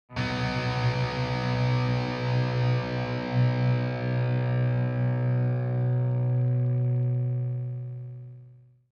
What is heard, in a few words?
power chord